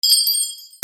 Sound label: BELLS